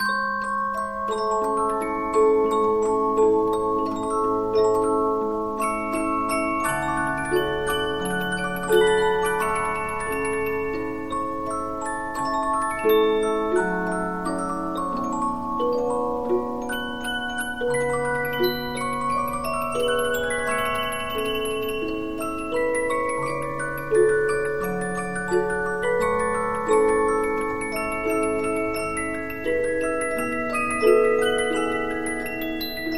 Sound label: Polyphon, 15inch disc musicbox